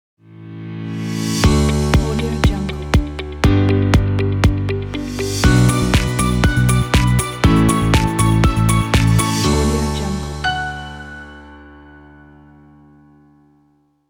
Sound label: corporate inspiring logo